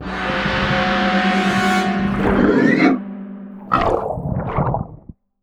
Creature Hit Leg Anim

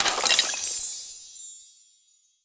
bonus Get Coins; netent

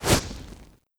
Hit Light Volcano Add; netent

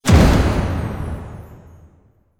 multiplier Medium Land; netent; starburst xxxtreme

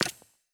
reel Bounce; netent

